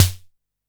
Snare Grit Low, drums

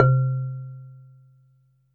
Marimba Maple C2; Mallets

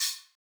Hihat Closed Room Feel

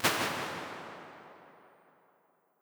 Plates Steel Plate R; Impulse Responses; Ableton Live 12 Lite

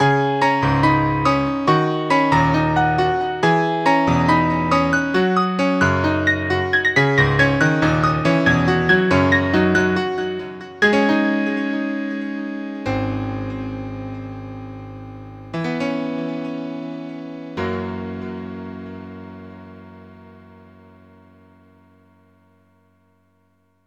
bgm sky piano; ZeroRanger

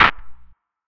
Clap LoFi Processed, Drums; One Shots; Ableton Live 12 Lite; Core Library

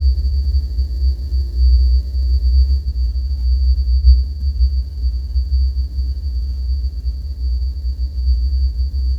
Soft Tinitus; Loops; Texture; Ableton Live 12 Lite; Core Library

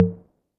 Tom High Chop; Drums

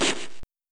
Vulcan Shot; MMBNMMOP

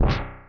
Sword Inside; Weapons; Open Zelda; Quest Designer 2.0.4b

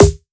Snare Perc Combo, drums

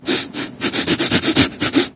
drink pooch punch; There; ThereClient

